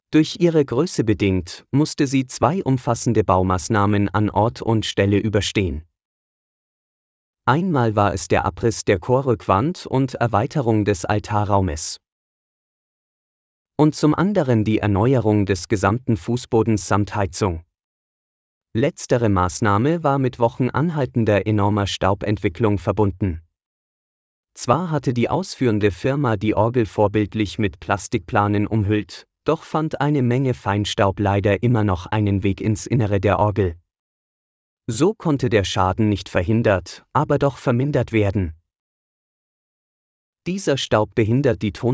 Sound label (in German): Bergkirche Orgel; Hochheim; worms weinberge